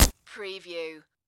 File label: Bullet Windshield Hit; sound-effects-bible